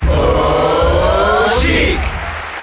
Cheer International; Sheik; SSBM

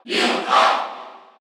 Cheer Korean; Lucas; SSBU

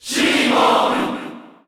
Cheer Japanese; SSBU

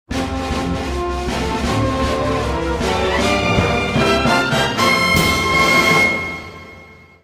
Victory Theme; Fire Emblem Series

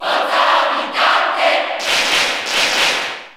Cheer Italian; Villager; SSBU

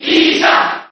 Cheer JP; Dr. Mario; SSB4

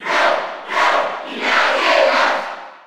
Cheer Spanish; R.O.B.; SSB4

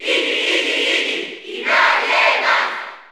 Cheer Spanish NTSC; Iggy; SSBU

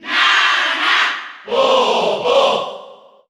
Cheer Italian; Ice Climbers; SSBU

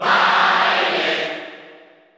Cheer Spanish; Byleth; SSBU

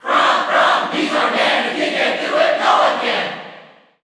Cheer English; Chrom; SSBU

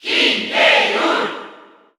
Cheer Italian; King K. Rool; SSBU

